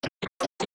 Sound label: Ticktock; "Tick-tock" timer in 3 speeds (loopable); OOT